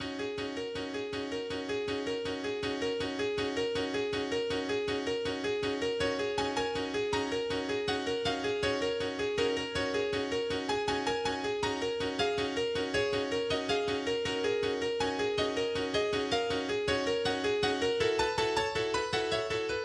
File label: Space Music